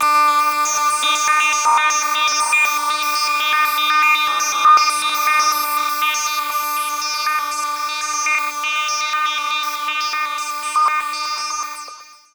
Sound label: PWM Polymod S+H C 120, Trance Sequences; Trance Formation